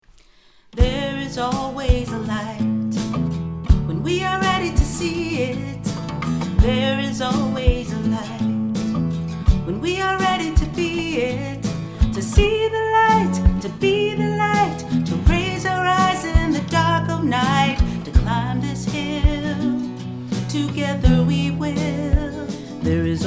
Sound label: All voices band, choir; freedom choir